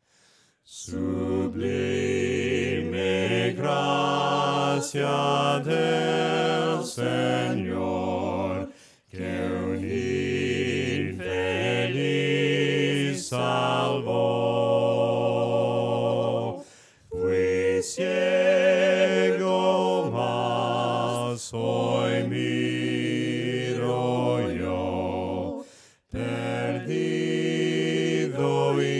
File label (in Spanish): VOCES, himnos a capella; cantos del camino; SUBLIME GRACIA